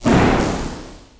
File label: Claw Mutant Laser; Playable Tutorial Level; TR3